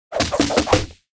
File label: basic Attack; tenshi